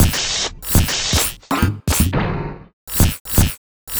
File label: Robot Thunder, Modular Electronica